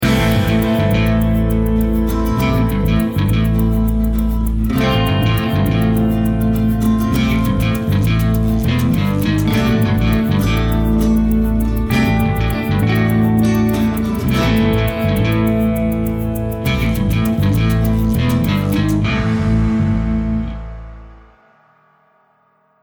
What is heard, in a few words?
start round